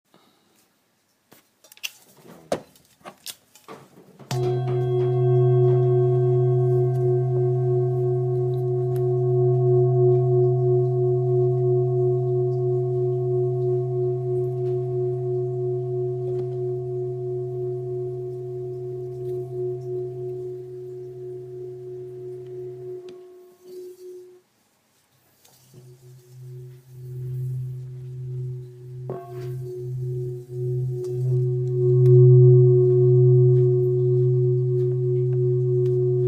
Bol bengale do

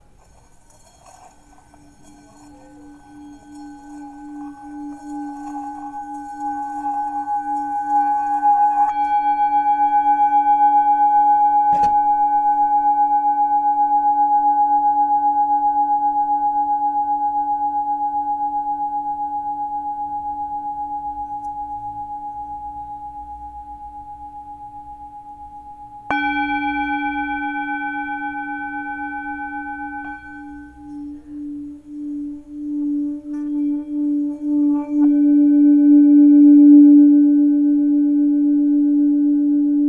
Bol bengale do dièse; 7-800g

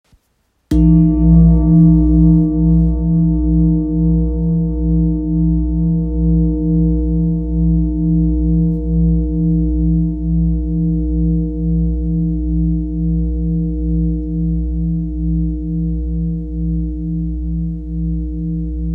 Bol ramgandi la diese

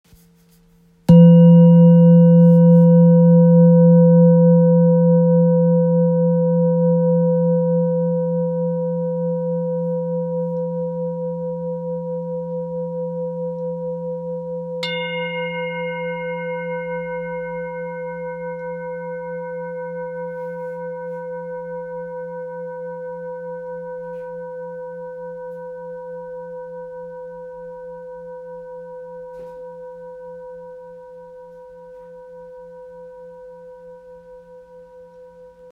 Bol thick la dièse 2.32kg

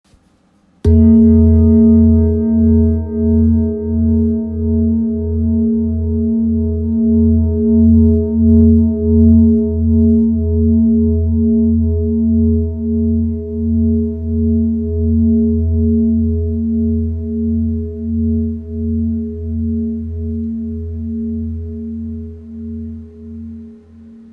Bol ramgandi re diese 1.47kg

